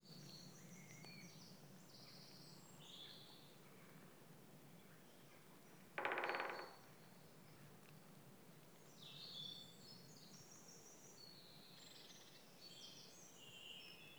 dew drops wind birds woodpecker, Woodland Atmosphere; Mindful Audio